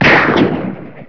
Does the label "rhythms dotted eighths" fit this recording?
No